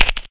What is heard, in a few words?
Click, 8-Bit; Games And 8-Bit Kit Volume2; atomsplitteraudio; chiptune